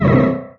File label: hoverbug nitro; There; ThereClient